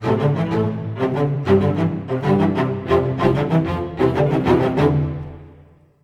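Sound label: 90 A minor, Low Strings, Orchestra; PowerFX - Downtown Orchestra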